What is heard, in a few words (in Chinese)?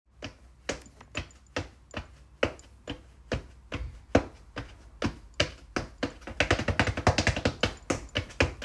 脚步